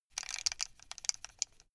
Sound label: buckle rattle short fast